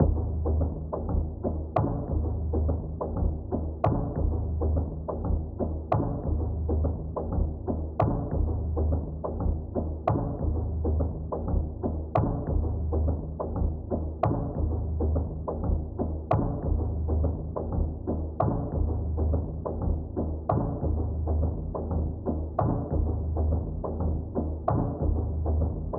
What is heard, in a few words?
Korg DW 8000